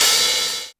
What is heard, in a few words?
HH, HIHAT HIP, Dance Mega Drums; Best Service